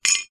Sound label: ancient game metal sequence slide gear drop mechanical gear mechanism accent; epic stock media